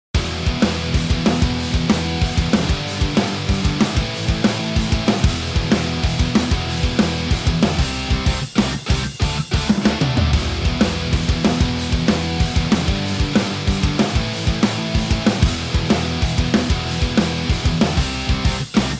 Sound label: 8 Bar